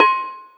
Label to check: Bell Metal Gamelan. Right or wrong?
Right